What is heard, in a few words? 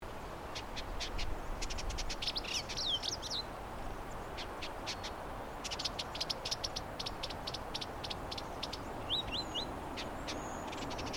Vidua funera; Indigobird Song